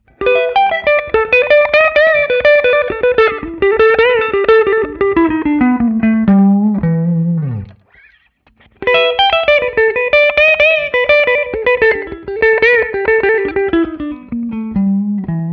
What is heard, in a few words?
Guild Starfire III, Rockabilly, 12th-Fret; Westerly-made; 12th-Fret Nonsense; Guild Starfire III from 1997